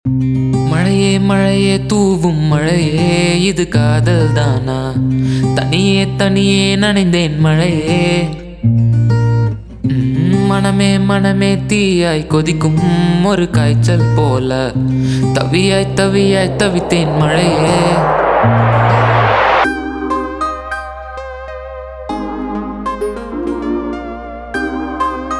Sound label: mazhaye w guitar